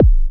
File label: ASR-X Kick, Ensoniq